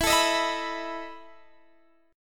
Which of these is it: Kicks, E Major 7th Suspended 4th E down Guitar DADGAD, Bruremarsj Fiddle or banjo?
E Major 7th Suspended 4th E down Guitar DADGAD